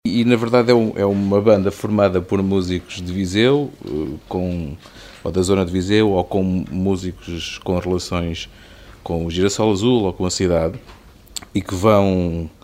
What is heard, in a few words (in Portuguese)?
festival jazz